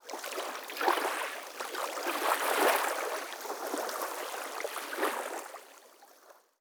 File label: river lap; anubis